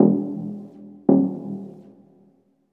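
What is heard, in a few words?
138 G minor mid bass; Ham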